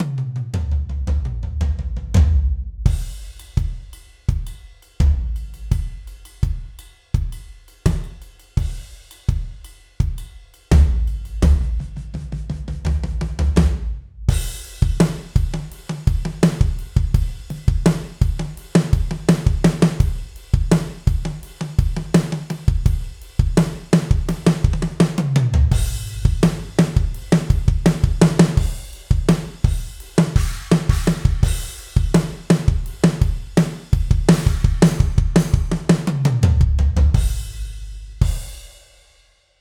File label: gospel drum only studio room; gospel drum kit ii; polarity audio